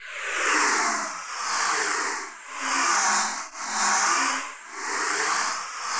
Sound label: WHIZZ BYS; Distored Reality